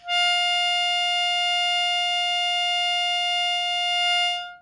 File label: hohner soprano melodica F4